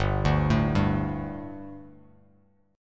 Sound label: G Suspended 2nd G, down, Bass Standard; Gsus2 arpeggiated; JGuitar